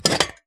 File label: welding tool pickup; Items handling